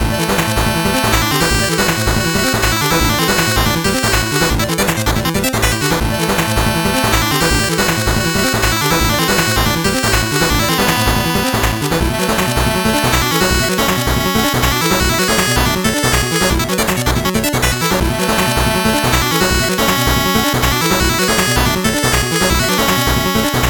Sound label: 8bit bgm fast